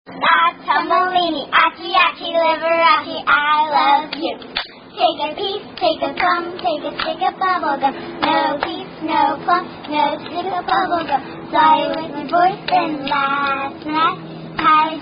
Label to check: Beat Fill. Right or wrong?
Wrong